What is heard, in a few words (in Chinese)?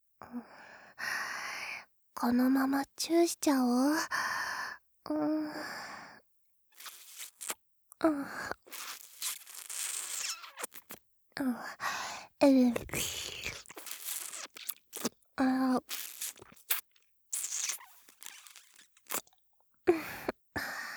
亲吻, ASMR; 和纱彩的亲吻